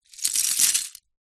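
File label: leisure lego pieces drop onto pile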